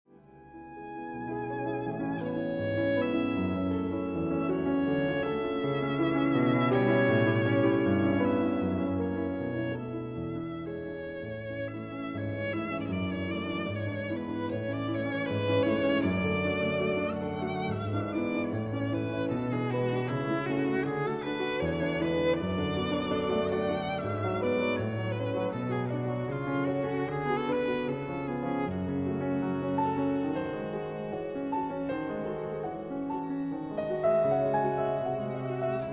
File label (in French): Romance violon piano